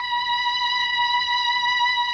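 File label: MELLOSTR, Mellotron Violins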